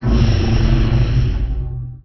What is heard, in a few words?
ornithopter motor; Emperor - Battle for Dune